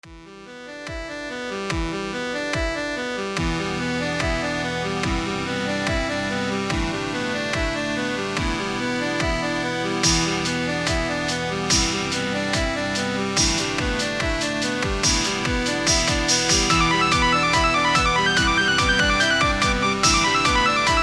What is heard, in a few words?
Chiptune; Zzzyt Release; Chiptune Test 9 (Remix)